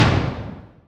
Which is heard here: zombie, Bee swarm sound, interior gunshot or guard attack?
interior gunshot